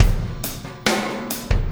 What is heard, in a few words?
Impact Crater Beat, Processed Drumkits; Sonic Foundry (Sony Creative Software); Processed Drumkits Zero Gravity Beats